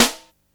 perfect snare single hit a sharp key, snare sample tuned to the A# note